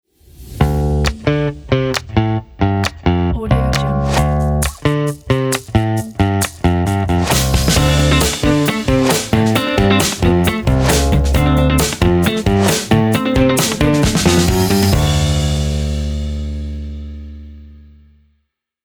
funky intro logo pack

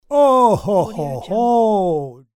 ooh ho ho

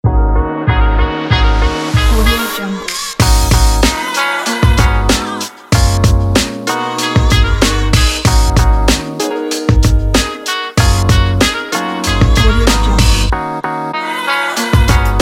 jazzy hip hop kit

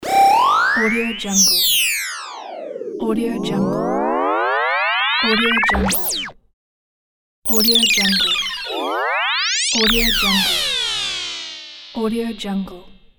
high tech space sounds

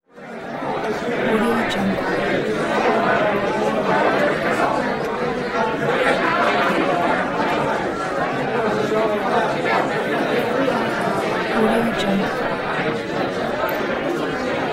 crowd noise

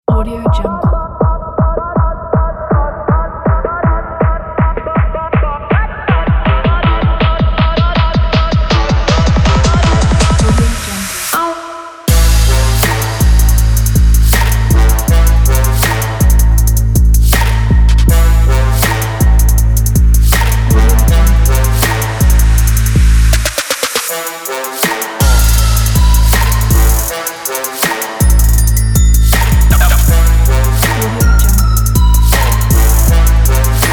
trap

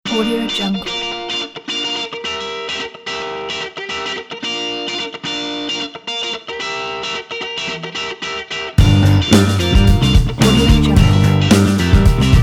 uplifting funky music

